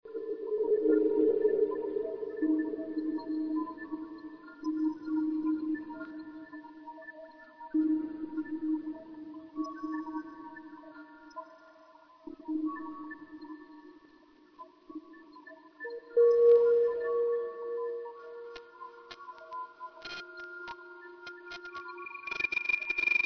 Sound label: sunrise qrm; messmer